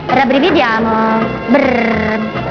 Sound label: brrrr